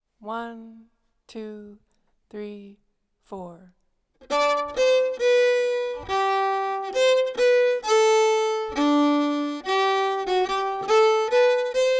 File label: slangpolska fran sadermanland slow, fiddle